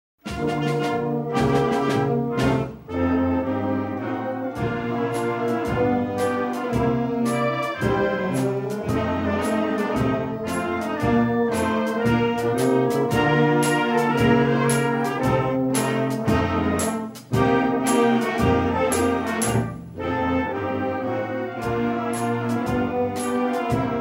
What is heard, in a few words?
Soft shoe shuffle